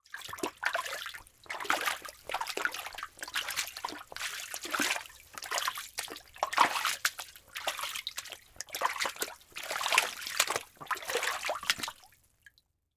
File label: Liquid Water Water Footsteps Walking In Water